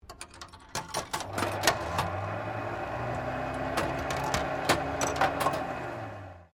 Slot Machine Conveyor Skill Tester Operate, Various old coin slot machines